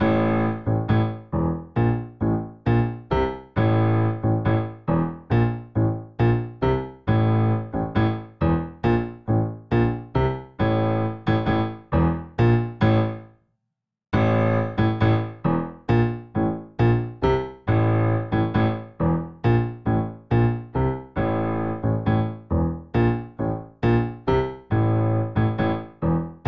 Vamp Phrygian Octave Bass Staccato A Minor - Bb Major 68 bpm, Grand Piano; MIDI Clips; Ableton; Factory Packs